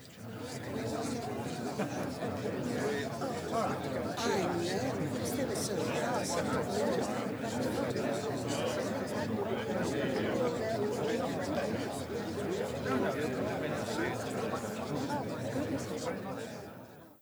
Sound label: Murmur Bed